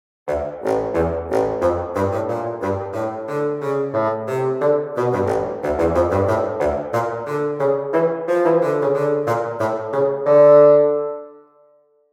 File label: Orchestral Winds; CineWinds CORE; Orchestral Winds Essentials recorded at the MGM Stage; Cinesamples